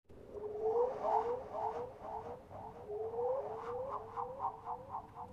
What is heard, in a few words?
Cave Echo